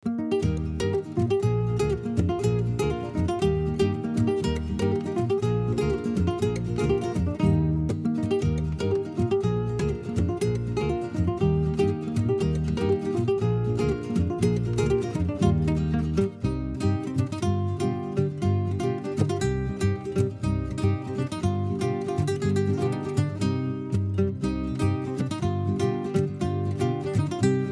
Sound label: Music Vehicle Radio Events